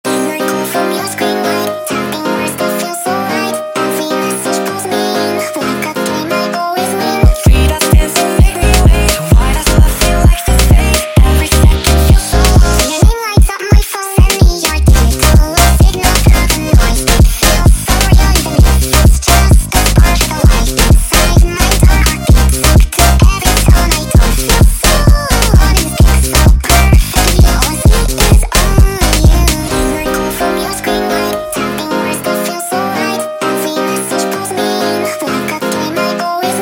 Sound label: sped Up, funk